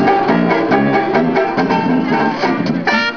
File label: skiffle